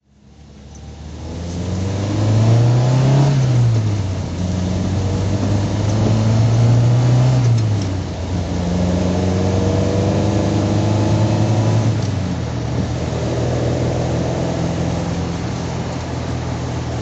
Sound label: facel3 drive